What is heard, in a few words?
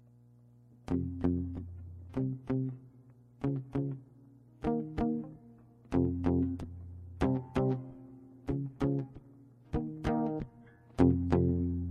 guitar cover